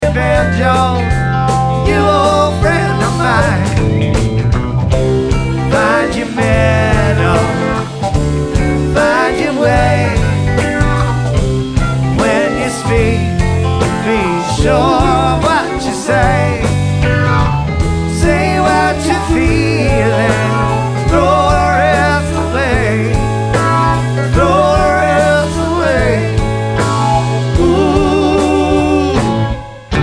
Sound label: Banjo